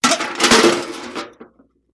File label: Kicking Bucket; crashes